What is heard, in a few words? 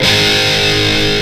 HIT